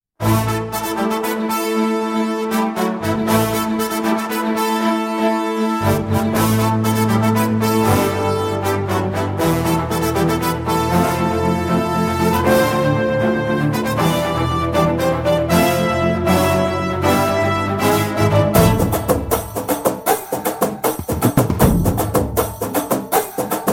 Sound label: Musiq